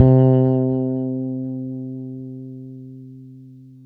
BRITE FRETLS C 4, Bass; Spectrasonics; Bass Legends CD 1

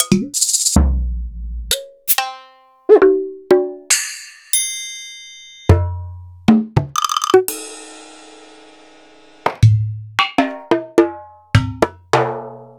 EMU Planet Earth

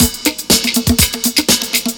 120 DISCO; USB Soundscan vol.20; Fresh Disco House I; AKAI; DISCO ADD

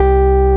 SYN, Analog; Sound & Vision; Gigapack I CD 2

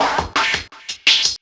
loop, 170 BPM, drum & bass

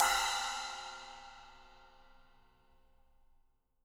FX CYMBALS; Sampleheads; Peter Erskine Living Drums

